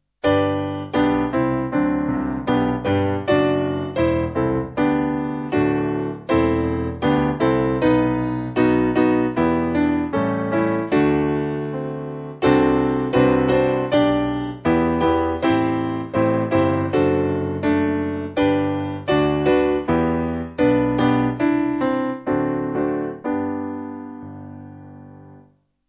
piano

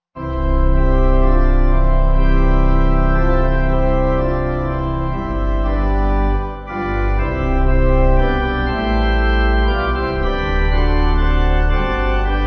Pipe; J. McGranahan

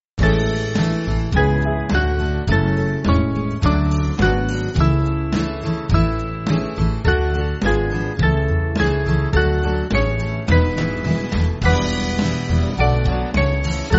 Band; O Light Of Light; Whitehall

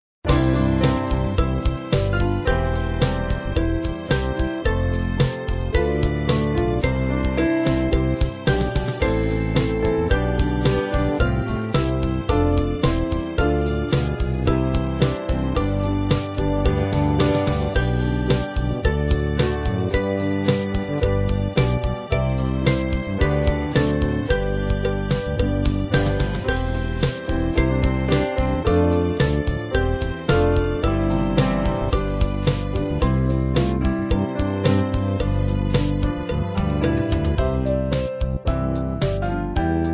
Band

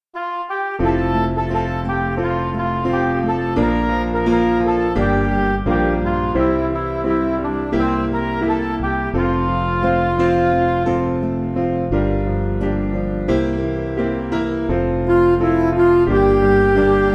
Music Backing Files for Churches

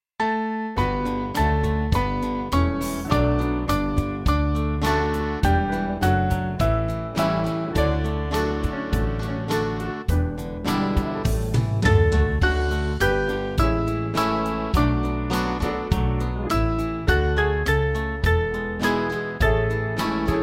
Band; Swabia

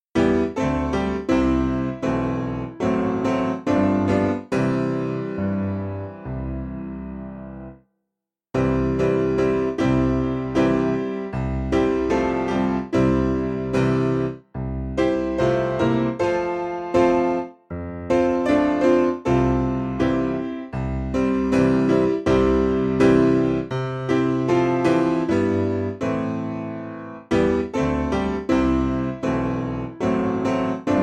Piano; I Have Decided; Assam

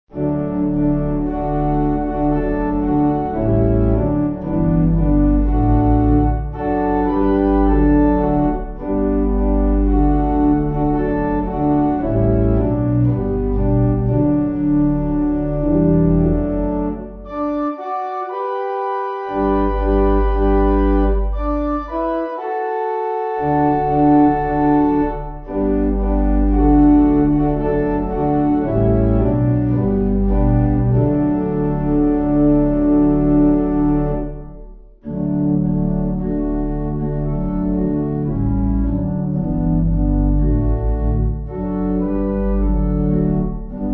Pipe Organ, Music Backing Files for Churches; Lord I Want To Be

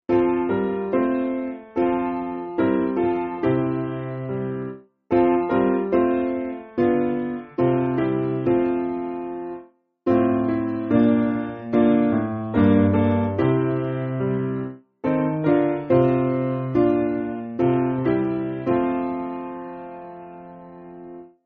Piano; Lamb Of Jesus; Gentle Jesus

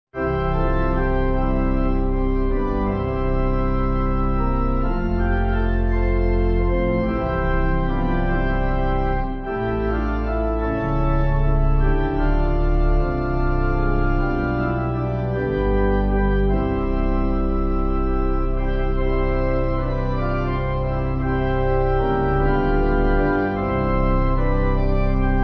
Pipe

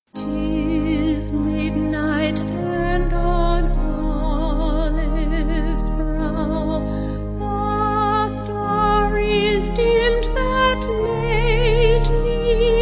Vocal Organ

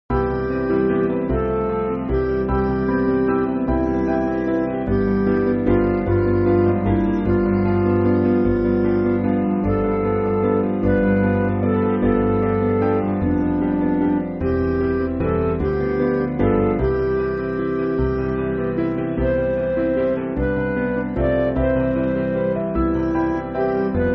More Like The Master, Gabriel, Midi

